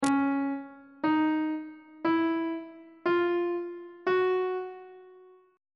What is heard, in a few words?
c sharp 3rd intervals on treble clef